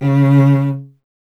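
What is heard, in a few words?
strings cello C#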